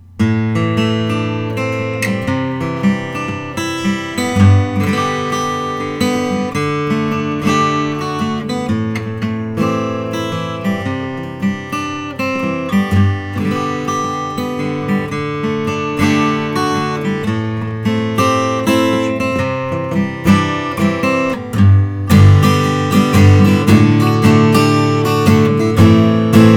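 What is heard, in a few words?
kevin kopp nick lucas full body sunburst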